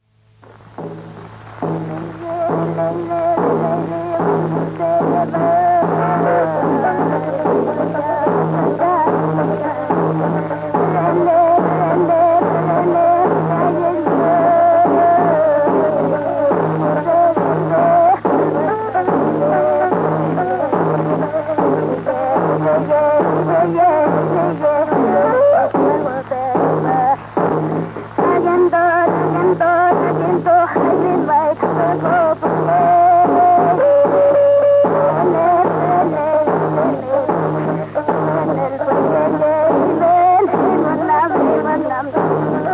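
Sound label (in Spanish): Canto de mujer con dos kultrunes y trutruka, Mapuche; Música Mapuche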